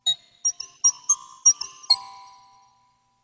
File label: Glockenspiel